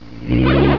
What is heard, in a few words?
Belch; battle anims; PokeRogue